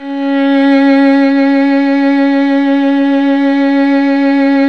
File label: STR VL C#5, String Chamber; String Master Series; Combos 4; Chamber Grp2